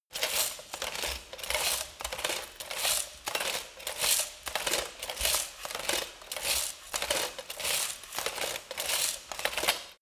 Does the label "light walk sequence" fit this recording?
Yes